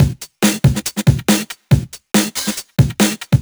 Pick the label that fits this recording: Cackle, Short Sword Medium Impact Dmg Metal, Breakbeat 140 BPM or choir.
Breakbeat 140 BPM